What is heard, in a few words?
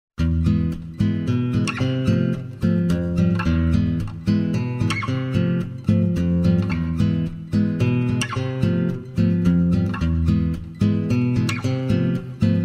Chill Guitar